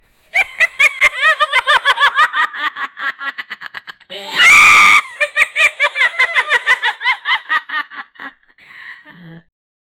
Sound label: witch laughing wickedly